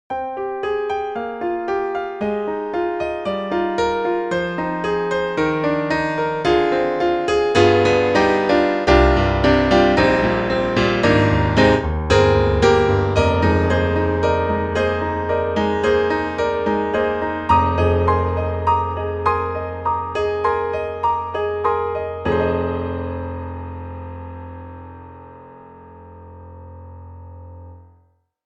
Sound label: Roland INTEGRA-7 Mono Grand (SuperNATURAL Acoustic Tone)